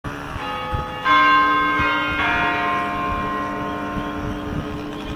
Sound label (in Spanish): reloj